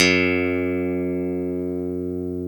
GTR STRAT, Clean E.Gtr, Brite Strat; Roland LCDP02 Guitar and Bass

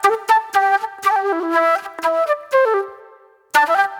Spit Plate Flute

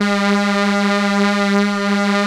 3100 SAW G 4, Korg PS3100; Back In Time Records; Korg Universe VOL-1

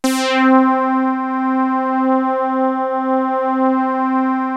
FAT SAWZ; MORPHEUS

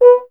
BRS F. Horn, Solo Instruments; Miroslav Vitous; Solo Instruments VOL-2

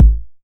909 BD; Goldbaby Tape808 & Tape909; The Tape 909

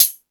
Rap Session, E-MU Pro-Cussion

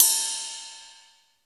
RIDE, DRUMS; Crypton - DrumBase5000; T1 DRUMS

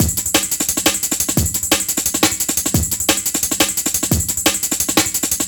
B, 175, classic dnb breaks

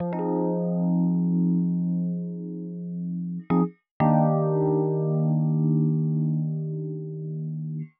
120 Rhodes Chords F, Keys, Nu Jazz House; PowerFX

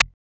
Perc, Rattlesnake Parametric Percussion System; BME Rattlesnake Parametric-Percussion-System

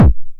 909 BD Tape Sat

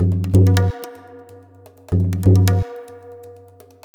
Tabla, 125 BPM; Zero-G; Pure Tabla

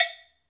CowBells

